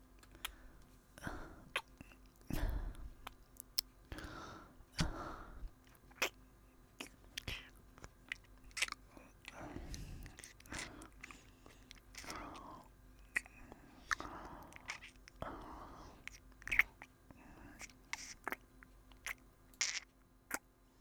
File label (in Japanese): 吐息; H歯磨きの後に・・・聞いてね